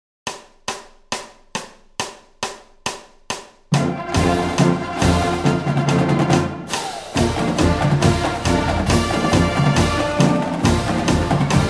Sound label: Click Track